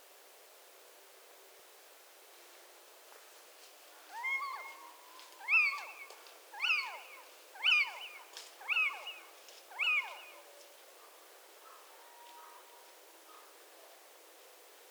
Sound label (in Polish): pojdzka ostrzegawczy